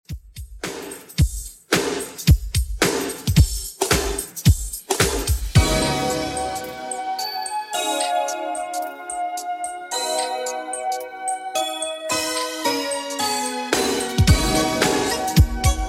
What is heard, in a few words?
110 bpm; 90s Redrum